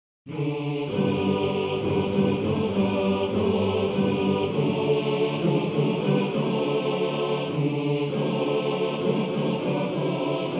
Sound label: Chor, maennerchor; Bajazzo